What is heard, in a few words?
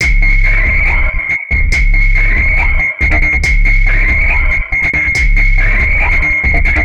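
Loop, beats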